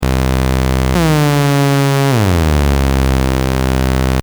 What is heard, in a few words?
adsr vco; emu workshop